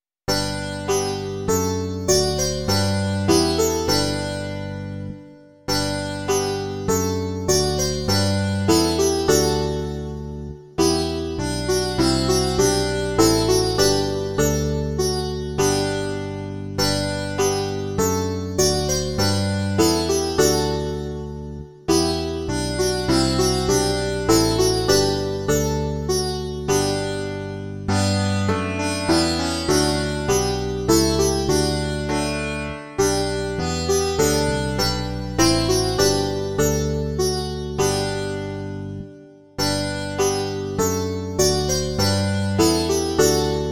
sitár, banjo a baskytara; Již zpěv, prosby, kázání; Imaginativní verze

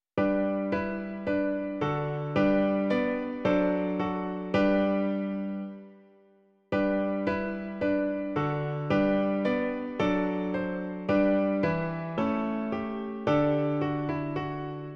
klavír; Umdléváš-li; Klavírní verze

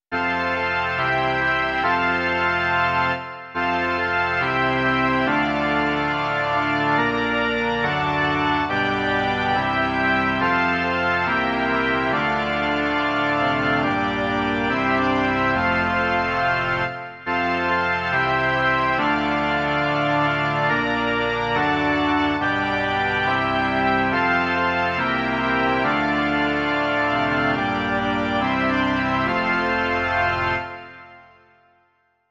varhany; Kde je dobrota a láska

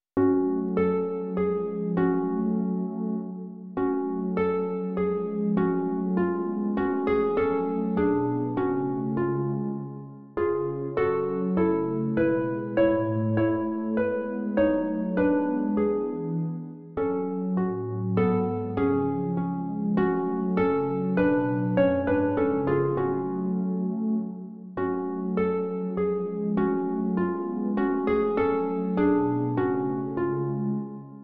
harfa a syntetizér; Beránku tichý; Imaginativní verze

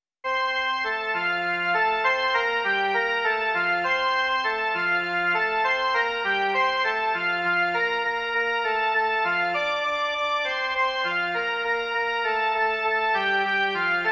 jen melodie; Přišlo jsi k nám, Jezulátko

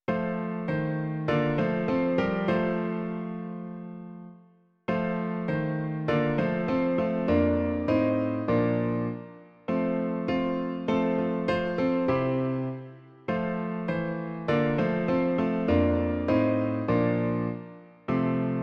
Ó jak věrný přítel Ježíš - klavír; Klavírní verze

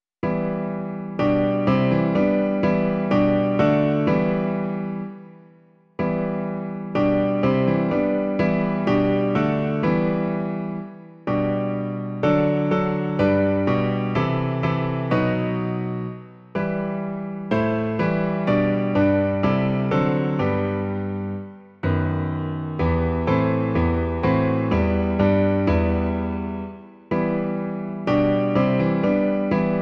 Ó veď nás, Pane, v slovu svém - klavír; Klavírní verze

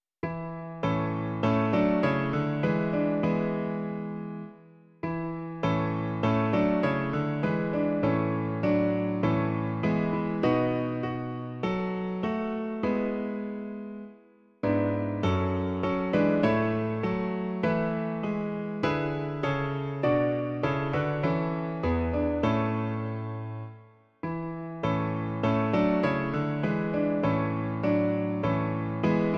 klavír; Svět jsem prošel v jeho kráse; Klavírní verze

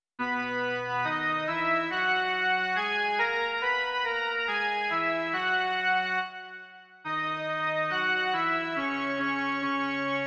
jen melodie, Doprovody liturgie; Otče náš; Doprovody Farského liturgie

